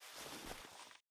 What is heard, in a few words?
torch use; STALKER; IWP; item usage